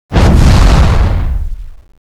Fireball ghast; ze minecraftworld

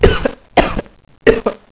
cough; zombie plague

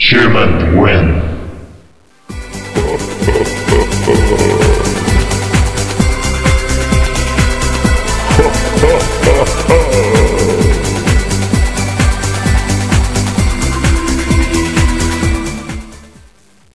human win; zombie plague

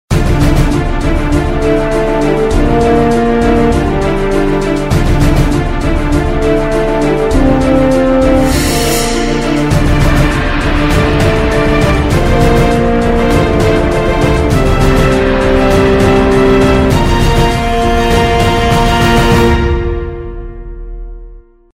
start

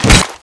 slash

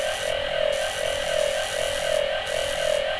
railgun idle charged, weapons

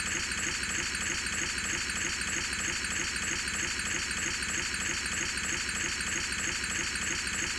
junk gun idle

